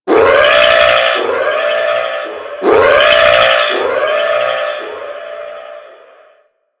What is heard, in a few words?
Submarine Alarm; Warframe; Grineer Ocean